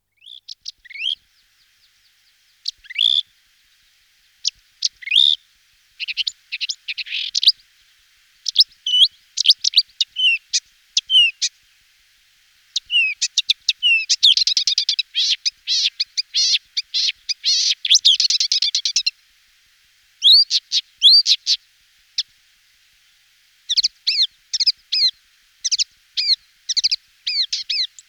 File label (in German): sumpfrohrsaenger, gesang; singvoegel; roche 2004